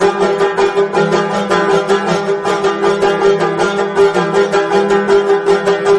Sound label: banjo; music